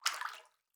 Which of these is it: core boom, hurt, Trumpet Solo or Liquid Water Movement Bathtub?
Liquid Water Movement Bathtub